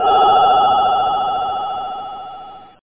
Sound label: lost in space; C-C-Alpha